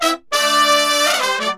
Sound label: BRASS SAMBA